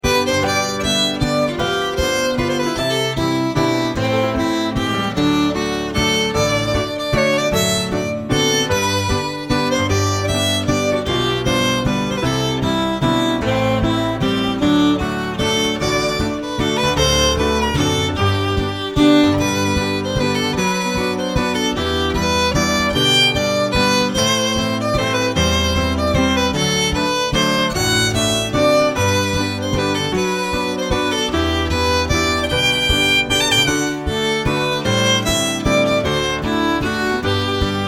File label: Waltz